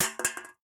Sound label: Empty Can Falling